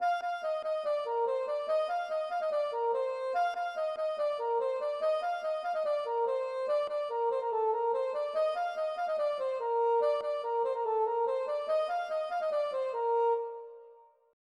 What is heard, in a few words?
plin polka; Plinn